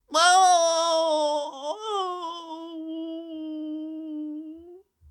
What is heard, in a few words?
Mender Death; Hollow Knight (gamerip) (2018)